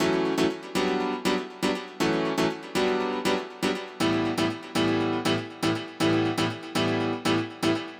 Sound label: Piano Part, 120bpm D; Zero-G; Nu Disco; Happy People